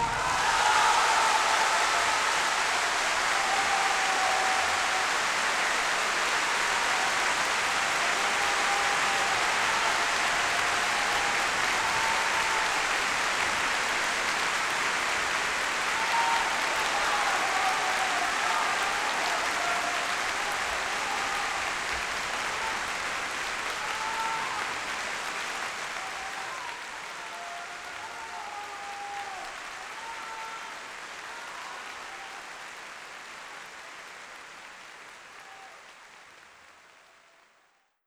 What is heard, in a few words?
Applause; Beethoven; Symphony No. 9 in D Minor, Op. 125